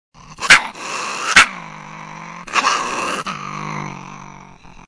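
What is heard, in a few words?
Grey Adult Growls, fox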